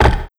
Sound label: Scifi Drum Tom; Audiopapkin